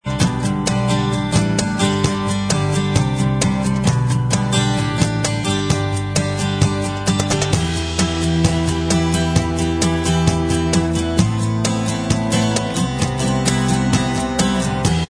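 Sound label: acoustic instrumental track, backing tracks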